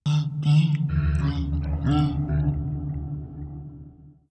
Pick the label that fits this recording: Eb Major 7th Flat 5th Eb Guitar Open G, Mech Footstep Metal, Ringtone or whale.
whale